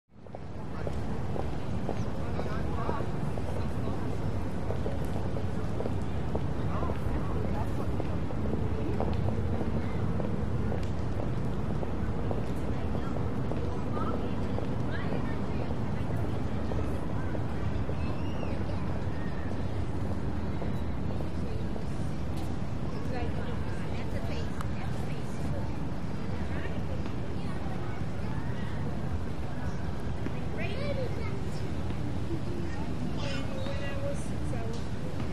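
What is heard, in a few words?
Large City Park Ambience; big city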